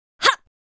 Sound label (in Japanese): ジャンプ（平常時）「はっ！」